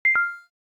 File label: cam stop; LG; Qua tab PZ LGT32